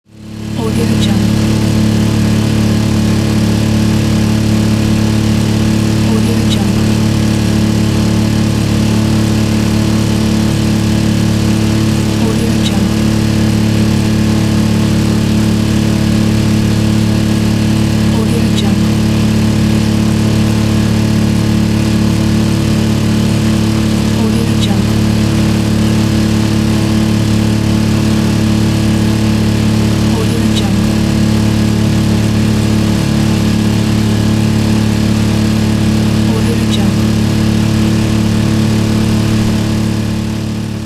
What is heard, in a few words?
motor boat engine running